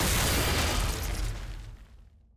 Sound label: skill hit; sett